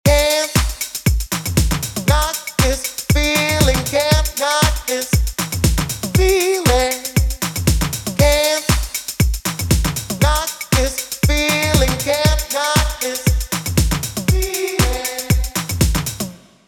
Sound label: Automated Reverb